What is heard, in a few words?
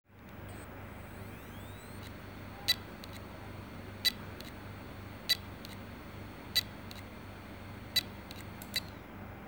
Heads seagate, damaged hdd sounds